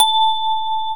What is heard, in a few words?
digi decay